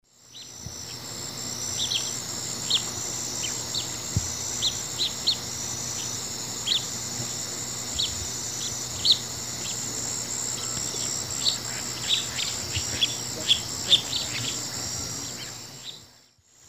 Hydropsalis climacocerca, flock and display calls; S. America; Eastern Lowlands

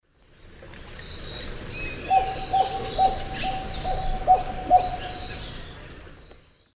Heliornis fulica, 7-noted call; S. America; Eastern Lowlands

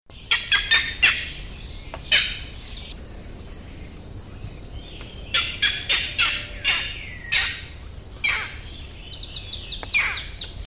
Striated Heron, S. America

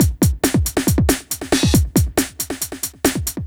Drum, Breakbeat

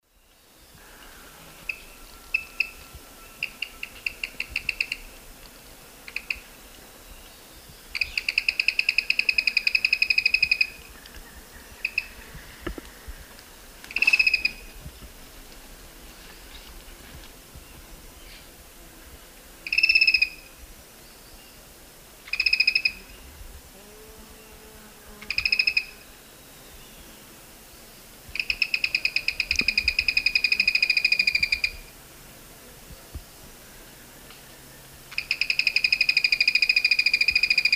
Hemitriccus minimus, call and song; S. America; Eastern Lowlands

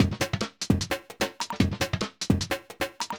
phewstep 150

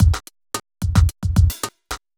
evil beats

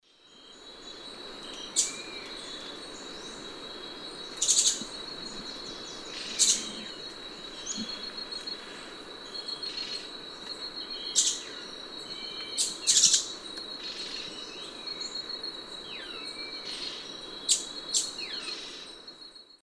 Schistocichla leucostigma, calls; S. America; Eastern Lowlands

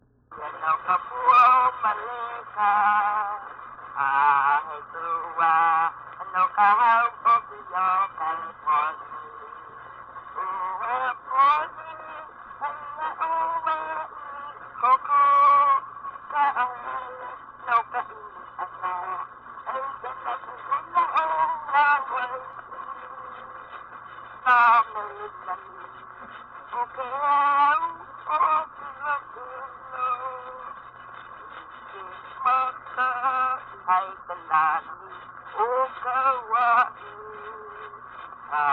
Name chant, Hawai'i; Na Leo Hawai'i Kahiko - Voices of Old Hawai'i; for Helen Roberts; World Music; Hawaiian

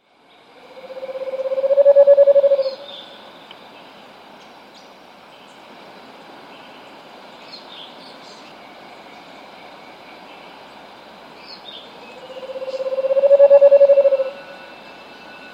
Scaled Antpitta, S. America

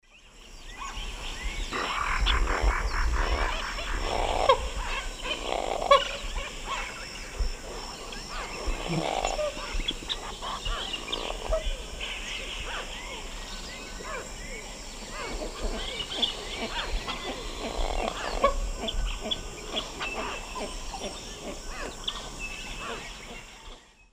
Gallinula chloropus, calls; S. America; Eastern Lowlands

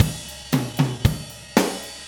Drums, Rock

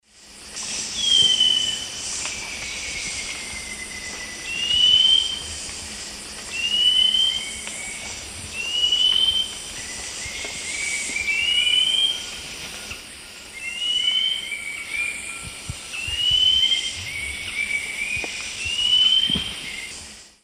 Hylophilus ochraceiceps, song; S. America; Eastern Lowlands

